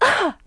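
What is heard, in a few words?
dying; NWNDS; Irlan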